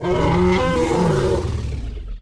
charger; giants

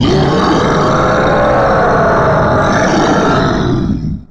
ROAR; kabuto; giants

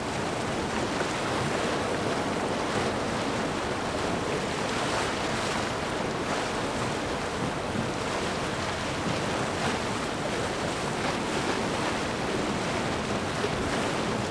jetski; giants

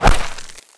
DIRT; permanentaliens; giants

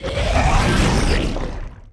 spawn, ripper; giants

